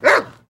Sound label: Dog Bark Generic, golden setter